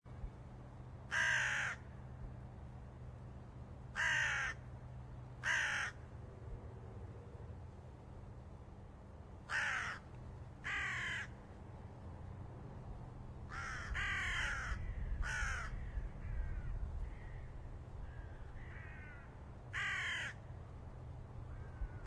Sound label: corvus, nature; Birds